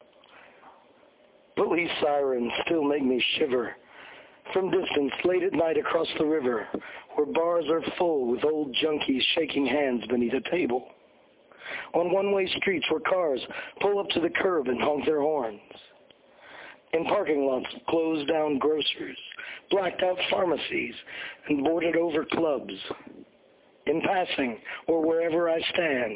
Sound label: Police Sirens